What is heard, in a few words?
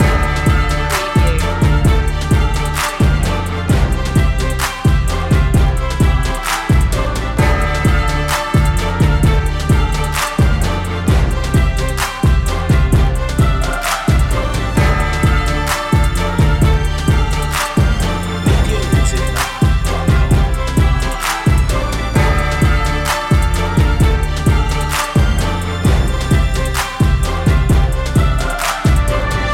beast mode loop